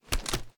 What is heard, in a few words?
reload empty end; gmod; wfoly plr sh charlie725